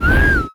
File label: slide; cookierun